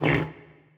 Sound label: grunt; smite; artemis v3 skin15